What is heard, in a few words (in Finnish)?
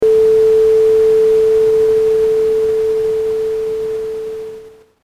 kompandointi